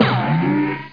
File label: doink; attic